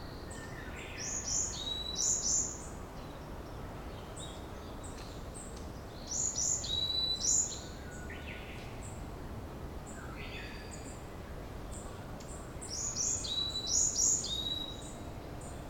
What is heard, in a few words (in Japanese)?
yamagara